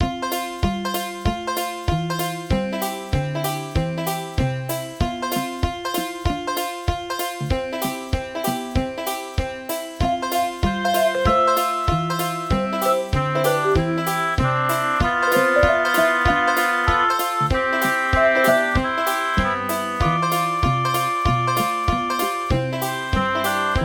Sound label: Normal Hillbilly Lullaby